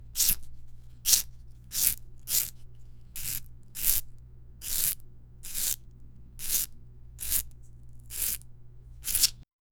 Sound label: felt marker; stationary